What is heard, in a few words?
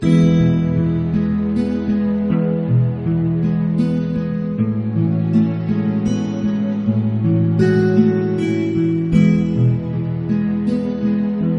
romantic